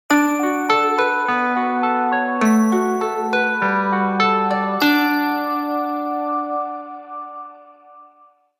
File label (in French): sonnerie bahut